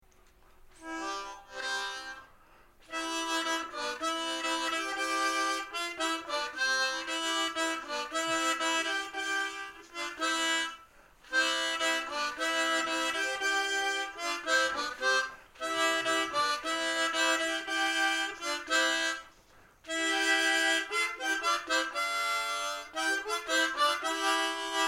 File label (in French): Harmonica Forez; La marche des anges